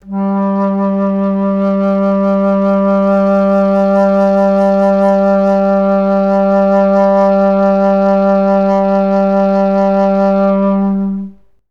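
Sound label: alto flutes sustain G2; Best Service; Peter Siedlaczek's Advanced Orchestra; AFS LONG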